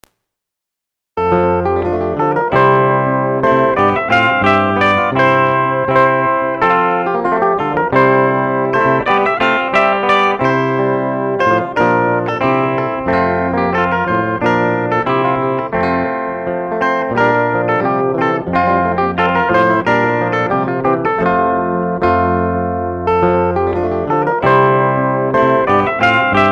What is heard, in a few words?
Loop